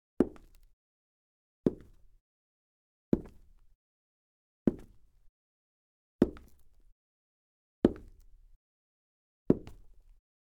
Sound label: Wood Hard Dress Boots, Foley Footsteps; Sound Ideas